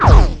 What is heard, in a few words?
ride in lightning